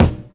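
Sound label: bass drum funk, drum kit; E-Lab; X Static Goldmine 2; akai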